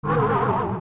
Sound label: Bubble Shield Down; zelda; OOT